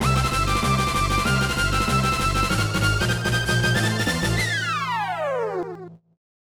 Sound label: Challenging Stage Perfect